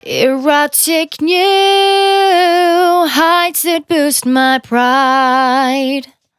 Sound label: Finally 70bpm C, Velvet Vocal; Zero-G